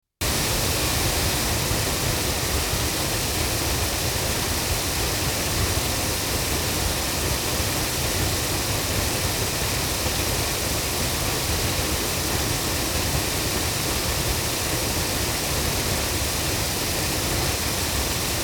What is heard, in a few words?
ADK A51ST loudspeakers, microphones recordings